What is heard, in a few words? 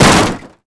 hit vehicle; NASCAR Thunder 2004